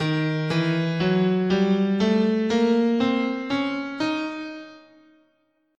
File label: Eb Diminished Half Whole, scale